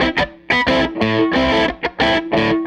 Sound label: Les Guitar Loop